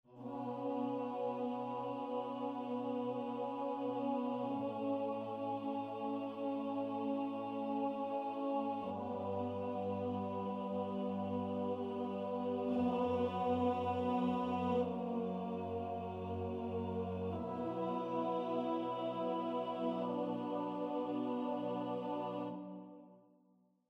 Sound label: SUStain Long